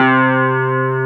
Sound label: HONNER C3, Keyboards Old School; USB Soundscan vol.09; AKAI; HONN.CLA1